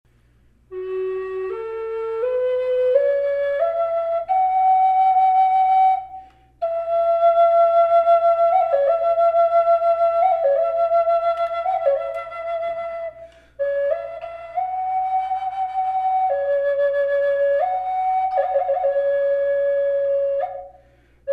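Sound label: reverb, flute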